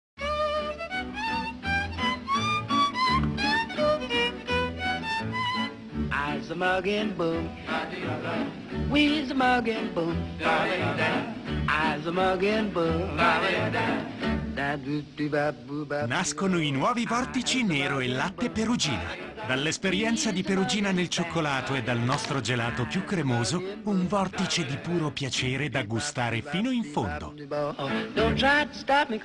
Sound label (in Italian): Pubblicita canzone; Vortici Nero e Latte Perugina